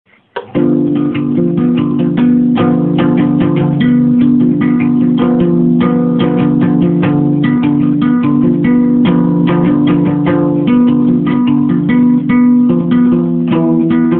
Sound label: shovel shovel